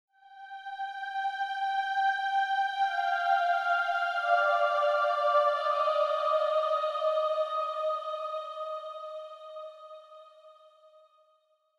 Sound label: amb hymns tonal; gamerip; blackfaun